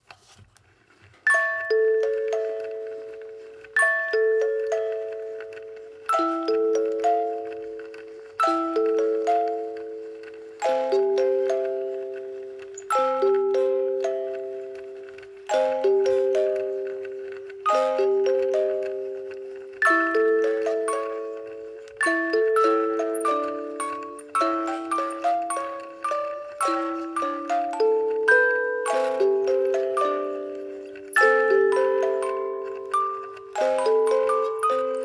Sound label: Music Box; oxenfree side stories